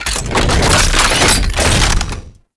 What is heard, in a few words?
Anti Air Gun Equip Ground; Warframe; Archwing; Kahl Grattler